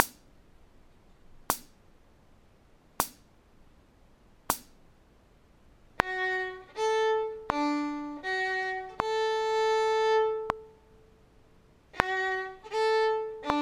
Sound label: d arpeggio, fiddle